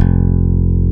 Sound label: F1, Bass Electric, AKAI S-1000; Retro Sampler